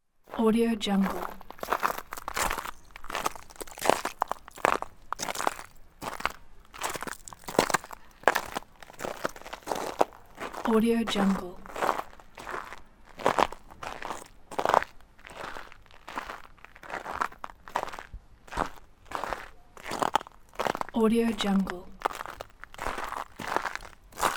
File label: walking on ice